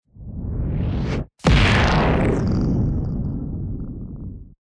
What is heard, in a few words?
Superhero inbound